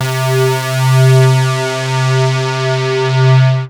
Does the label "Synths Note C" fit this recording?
Yes